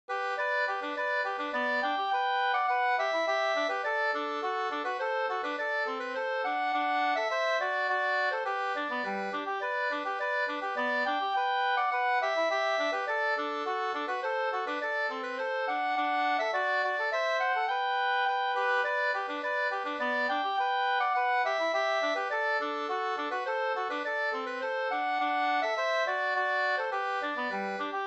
polka, 2st

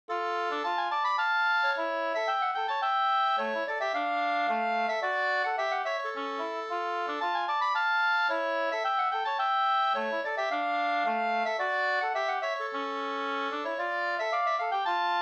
polska Bingsjopolska; e Pekkos Per nr9 2st

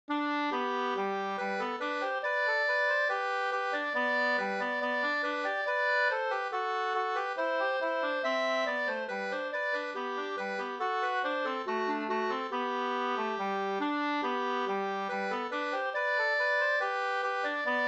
skanklat

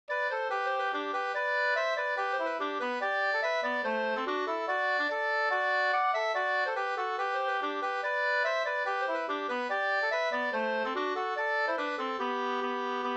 schottis; Bondbrollopsschottis Flen084 2st

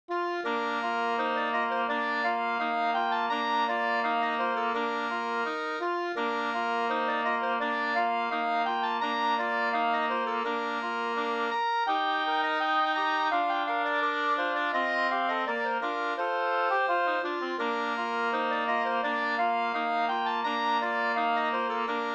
skanklat

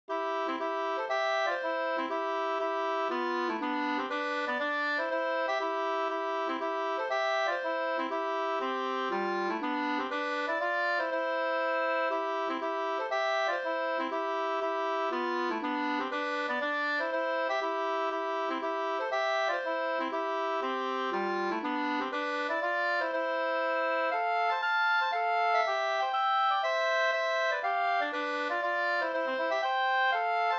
polska Lillpolska

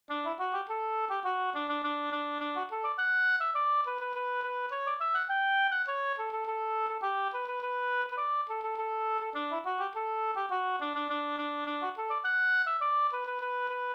polka polkett; e Jon Petter Liv Stode Mp

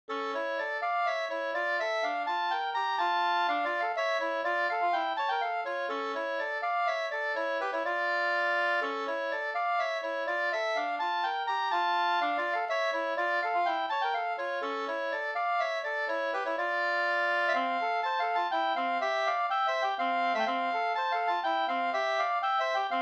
polska springlek; e Hallhans Per Nilsson Transtrand

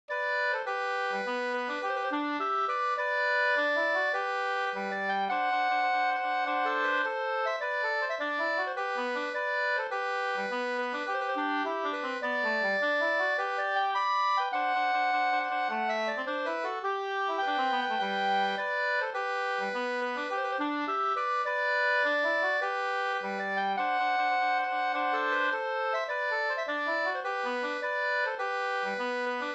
brudmarsch; e PJ Berndtsson

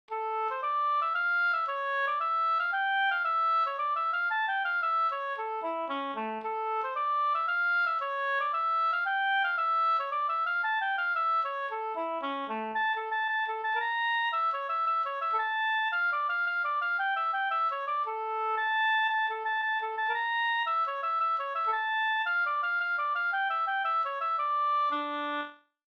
polska, D-moll

